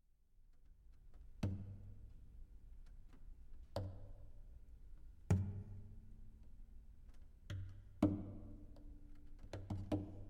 for cello and electroacoustics; chiaroscuro; hyperresonance ii

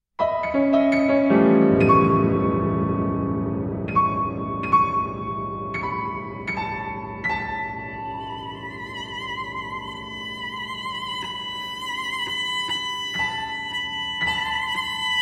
cello sonata; Armenian Music Project; Armenian music new and rediscovered

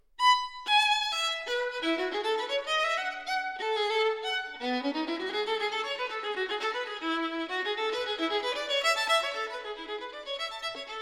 violin partita in e major gigue; violin partita no 3; bwv 1006

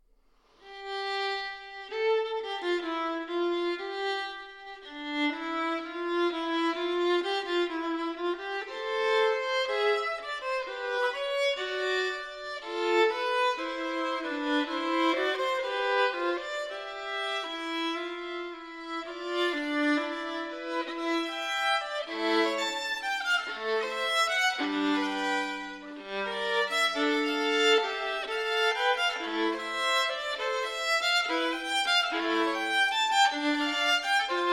violin sonata in c major fugue; violin sonata no. 3; bwv 1005 ii

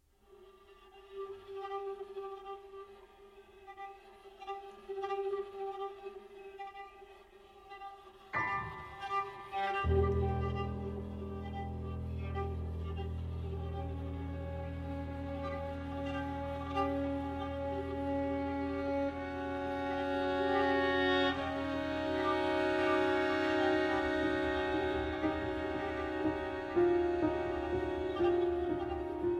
piano quartet

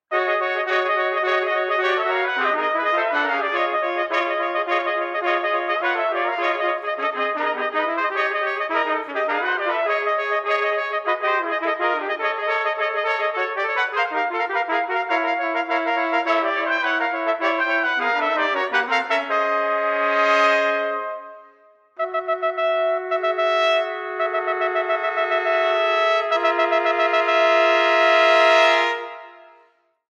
allegretto, horn; trumpets; fanfanfaren x; Andy Kozar

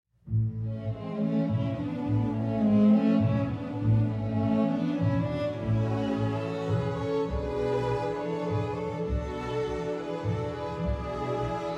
valse menuett

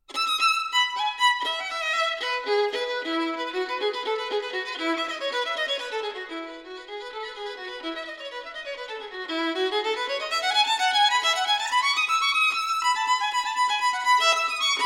violin partita in e major prelude; violin partita no 3; bwv 1006

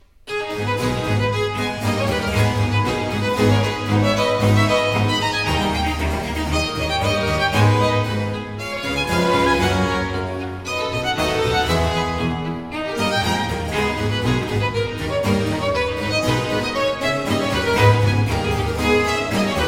concerto for cello in a minor allegro; rv 418 iii

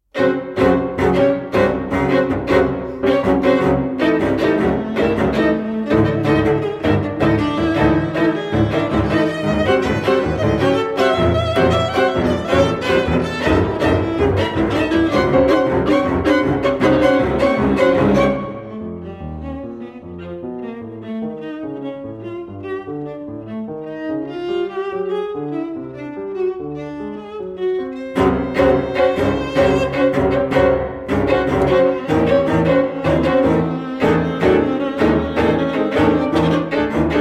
piano quartet

